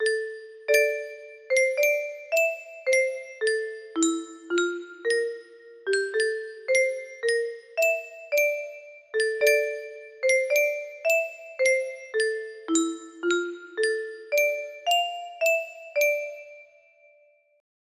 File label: lullaby, music box melody